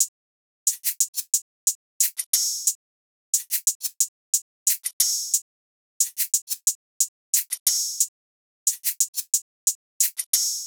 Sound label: Shake It Hihat Loop, 90 BPM; Cymatics; King Drum Loops